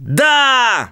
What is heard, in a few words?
Emote Cheer; the princess and the frog; Twitch Skijump